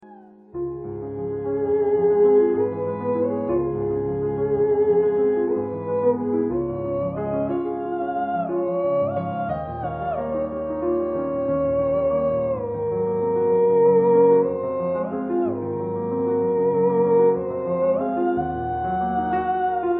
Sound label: theremin